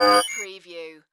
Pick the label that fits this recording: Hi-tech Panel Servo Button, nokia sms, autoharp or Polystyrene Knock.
Hi-tech Panel Servo Button